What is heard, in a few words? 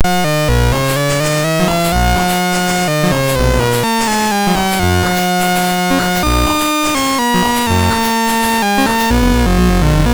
Reactor Run, MOS6581R3